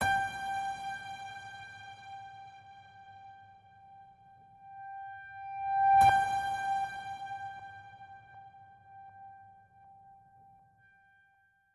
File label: Just A Piano Note G, Alt High